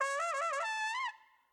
UI Splash Developer; They'll Come from the Moon

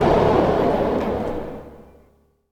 NOIS; XXL 800 Production Effects

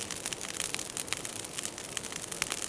Fire Torch Loop; Destruction

